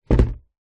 foley cardboard box fast food empty set down table